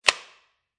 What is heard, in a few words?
Staple Gun Stapling Wood Single, Industry, Staple Gun, Stapling A Single Staple Into Wood